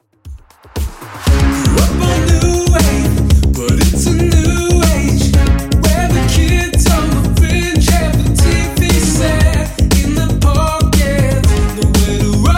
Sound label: 118bpm